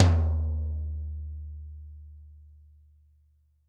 TT 33-1 M, Toms, Drums; Bob Clearmountain Drums I

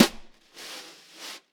Vintage Snare Drum; Q Up Arts; Johnny.C's Vintage Snare Drum; 5.5X14 SLR

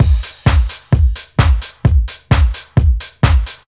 crystalnacht, Deep House 130bpm

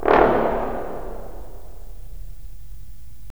BRS, Roland LA Composer

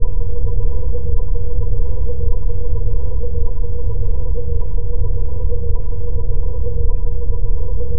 Drone Ambient; Drums & Drones

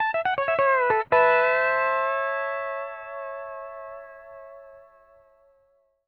R, Whole Lotta Country, ENDING140

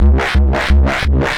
175bpm, Drum and Bass Percussion; EZ Rollers; Opening

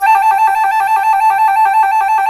C flute trill

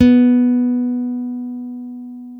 STEEL, Guitars

